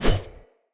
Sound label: Spear Whoosh; Weapons; Cleansed Spear